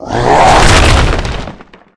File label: rip up rock; attack; source engine; left4dead2; tank